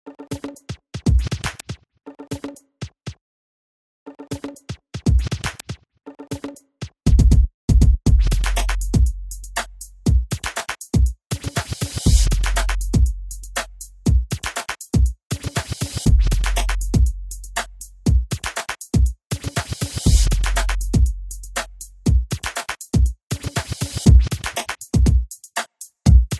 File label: Komplete Dance